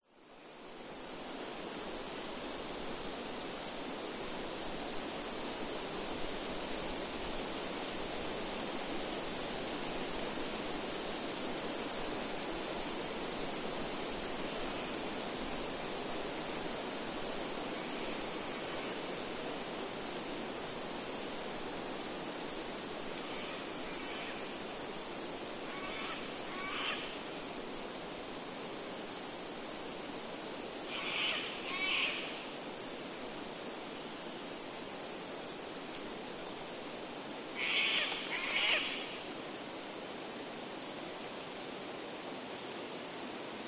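nature rainforest ambience wind trees leaves small branches fall birds cockatoo; cockatoo flies; zapsplat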